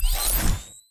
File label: Last Shot